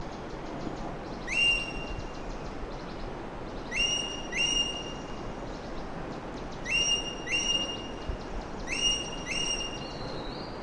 suara burung ekek layongan atau cissa chinensis

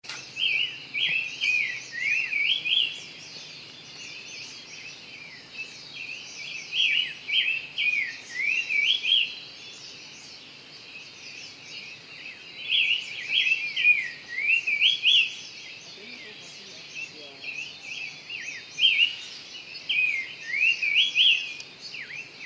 poksai genting

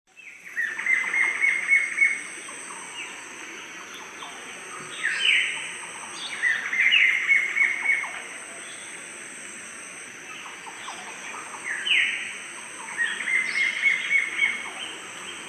suara cekakak pita dada jingga

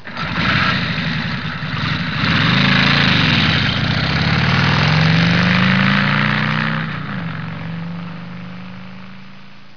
suara mobil

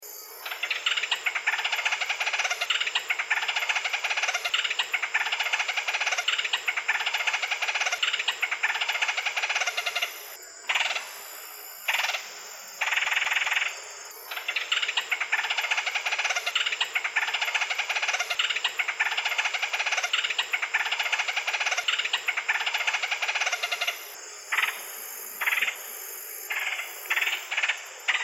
suara pelatuk palamerah

